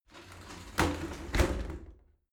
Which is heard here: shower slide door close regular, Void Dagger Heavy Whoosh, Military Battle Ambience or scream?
shower slide door close regular